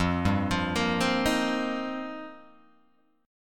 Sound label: F 7th Suspended 2nd Suspended 4th, Guitar Open G